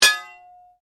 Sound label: Blade Heavy Moving Impact, Sword; Foley sounds for melee weapons; Swords; Combat